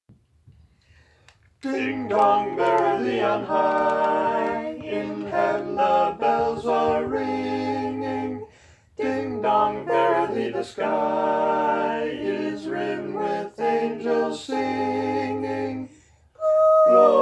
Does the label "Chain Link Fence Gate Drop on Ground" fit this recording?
No